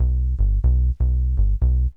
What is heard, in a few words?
feelbass; recreational research foundation